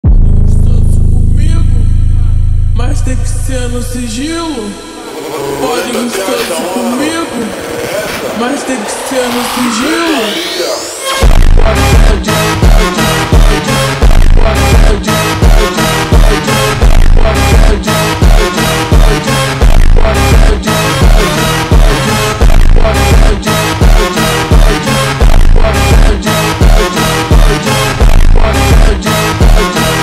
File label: automotivo slowed reverb